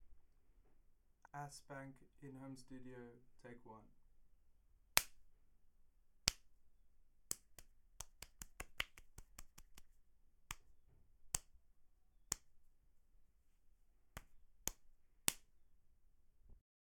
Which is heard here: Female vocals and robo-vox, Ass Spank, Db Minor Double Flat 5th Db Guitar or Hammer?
Ass Spank